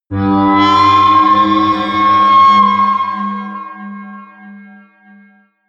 atmospheric feedback synthesizer low, drones

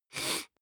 nose sniff male sick; koerpergeraeusche; menschen; soundpool

